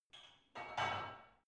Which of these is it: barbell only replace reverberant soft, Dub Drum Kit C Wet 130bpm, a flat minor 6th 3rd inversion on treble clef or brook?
barbell only replace reverberant soft